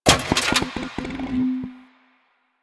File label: Vending Machine Bottles; Warframe; Ambience; Nineteen Ninety Nine